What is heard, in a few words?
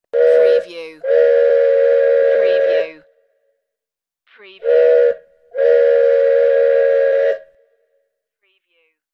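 Train Whistle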